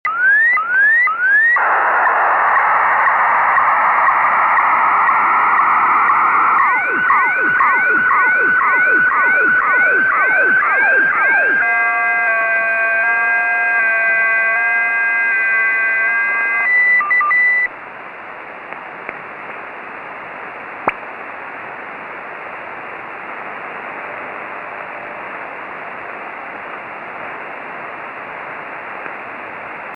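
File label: strange signal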